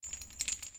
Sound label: Chain Move Slow